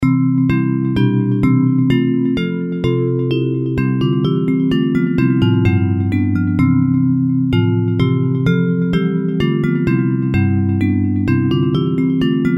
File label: Bells; Hymns